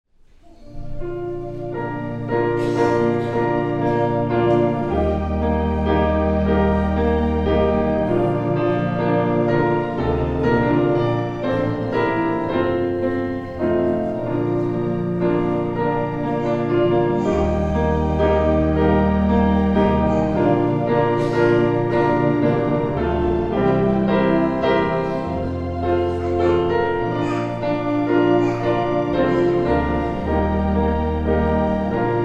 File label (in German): Vorspiel